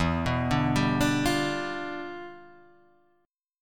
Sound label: E Minor 7th Flat 9th E